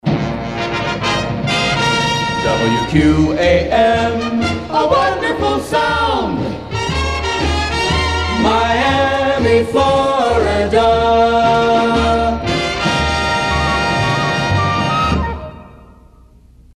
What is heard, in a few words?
Wonderful Sound; WQAM; JingleMill; WQAM jingle packages